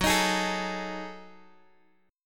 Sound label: Gb Minor 6th Add 9th, Guitar Open D; 16,18,18,15,x,x; down; chord shape